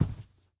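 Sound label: echoing close ringing sound of fireworks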